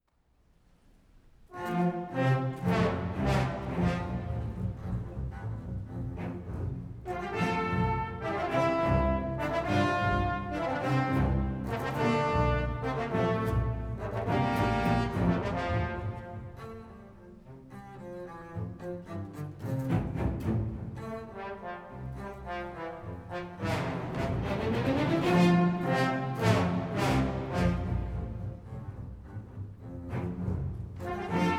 Vivo movement for orchestra

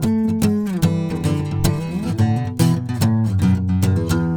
Slinky Guitar; Guitars

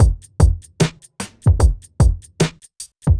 hiphop

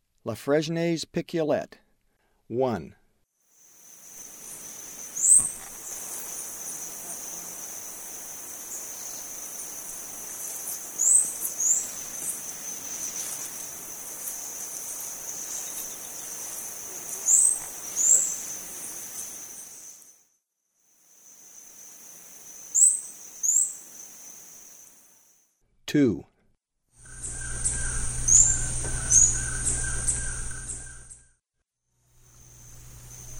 Lafresnaye's Piculet; S. America; Eastern Lowlands

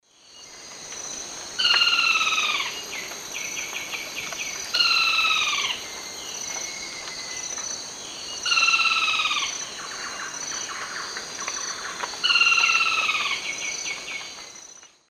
Automolus rufipileatus, song; S. America; Eastern Lowlands

